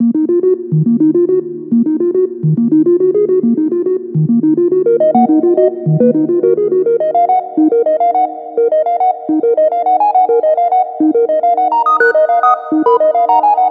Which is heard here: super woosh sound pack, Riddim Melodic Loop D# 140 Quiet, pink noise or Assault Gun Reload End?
Riddim Melodic Loop D# 140 Quiet